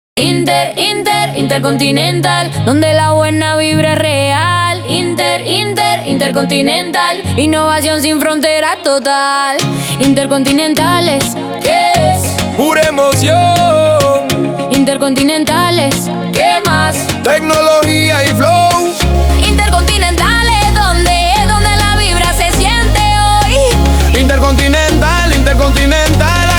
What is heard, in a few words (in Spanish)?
Jingle; Intercontinental